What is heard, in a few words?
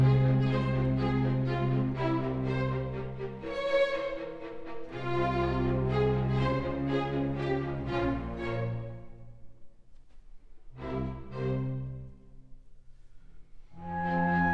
Clarinet Concerto in F Minor, Allegro